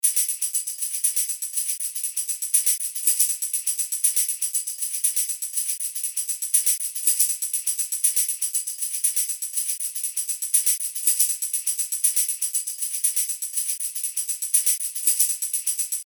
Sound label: tambourine